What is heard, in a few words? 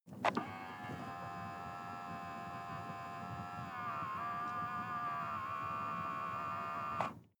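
sports car interior side mirror adjust long; verkehr transport; autos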